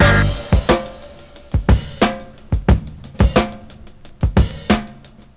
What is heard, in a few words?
bouncy lady, Boombap, Drum loops